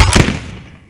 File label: paintball